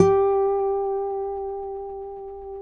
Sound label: Finger G 4 L, Guitars; Spectrasonics; Hans Zimmer Guitars VOL-1